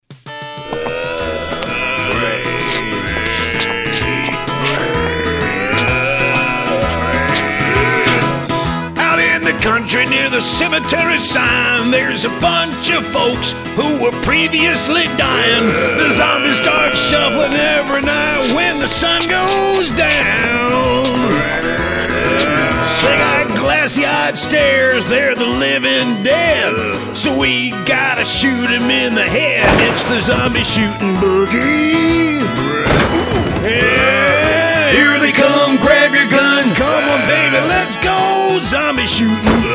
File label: zombie song